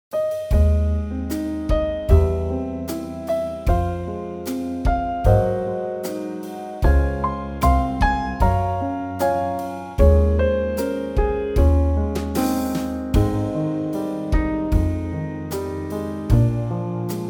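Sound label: Ab A Bb Trio, Backing Tracks for Male Vocals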